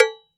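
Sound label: cowbell big, drum machines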